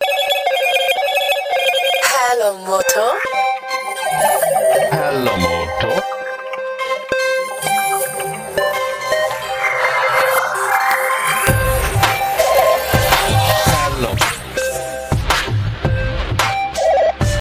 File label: motorola ringtone